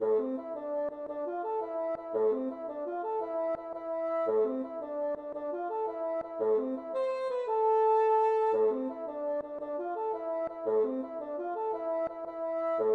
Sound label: jig